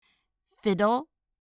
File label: fiddle